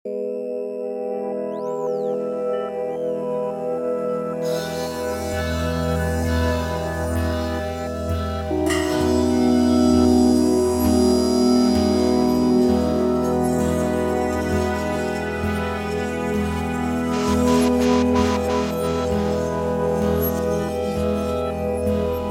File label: LOOP